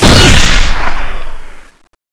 jet gun dash, weapons

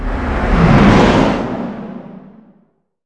charge fx dragon, weapons

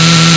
chainsaw, weapons

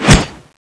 lance slash

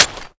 leap strike gun clip out

